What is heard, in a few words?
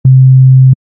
sine